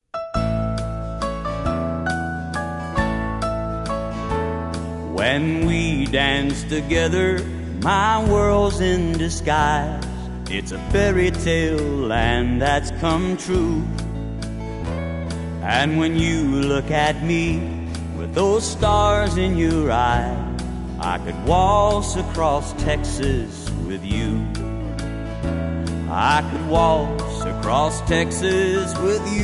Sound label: waltz